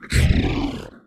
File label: Damage; Rust; Mob